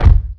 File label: Kicks